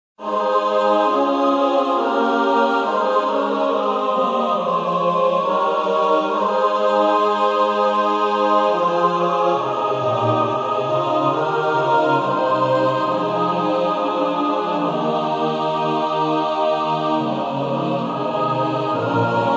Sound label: Choral; pasion segun san mateo 10 coral; johan sebastian bach